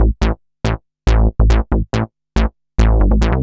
bassline, dance; Basses

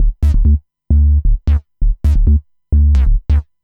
bass, dance

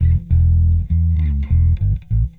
Classic Rock Bass

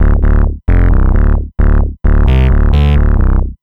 bass, dance

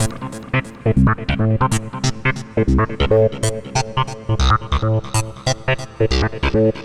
Synth, Synths Lead; Dance

